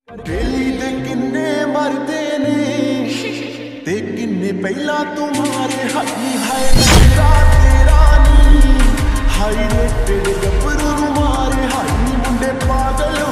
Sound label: official song ringtone